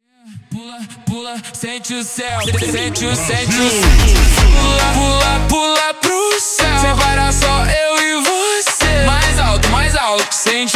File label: Jumpstyle ultra Slowed, funk